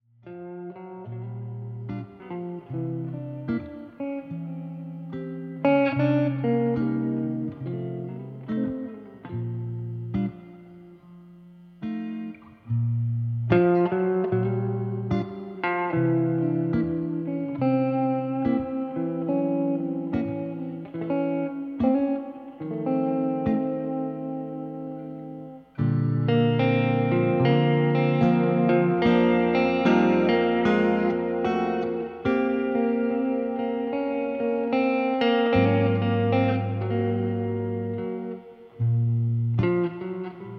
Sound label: two guitars